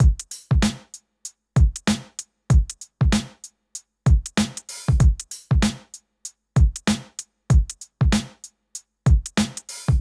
DRUMS, viral hiphop

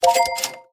purchase button click; golden wheel; City Verse Tycoon

